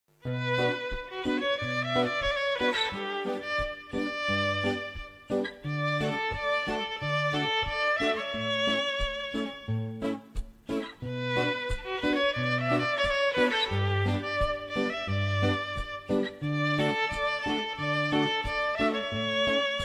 puerto rican violin ringtone

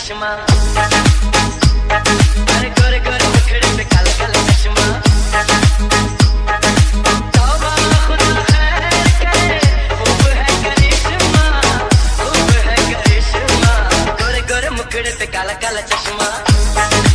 bollywood ringtone

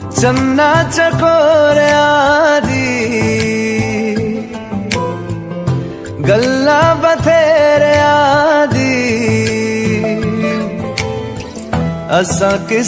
ringtone, bollywood ringtones

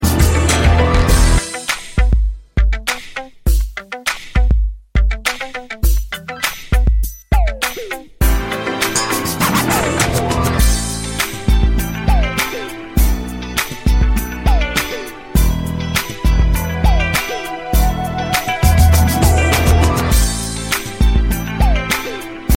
musical beat ringtone

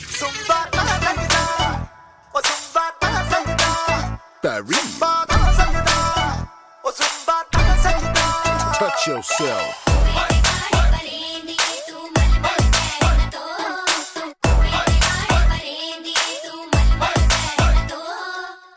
zumba punjabi ringtone; bollywood ringtones

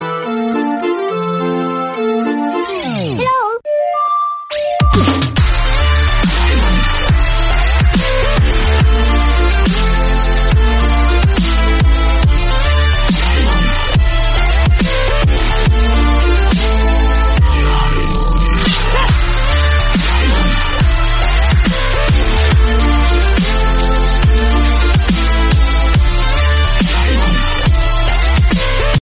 dubstep ringtone

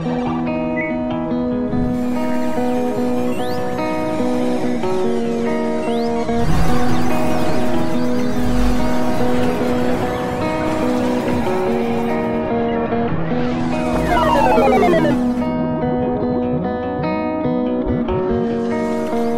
english ringtone

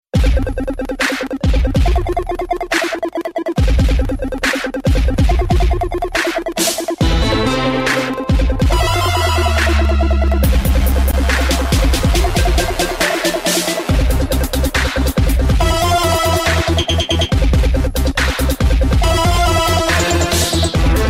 lumia 820 ringtone, nokia; nokia ringtones

